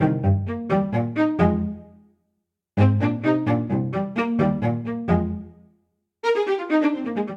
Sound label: Phrase Expanse i-III-iv C Minor 130 bpm, String Quartet; MIDI Clips; Ableton; Factory Packs; Spitfire Audio